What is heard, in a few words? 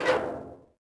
cauldron Swing; objects; Star Wars Obi-Wan; XBOX